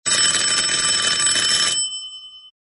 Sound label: bell doorbell long ring